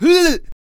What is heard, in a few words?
Chieftain Attack Vocals